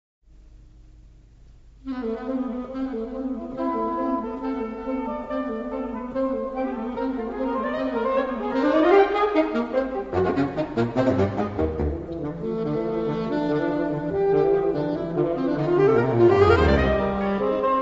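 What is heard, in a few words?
Sax Quartet Finale; Saxophone Quartet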